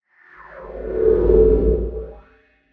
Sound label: transition; bluezone; sonniss gdc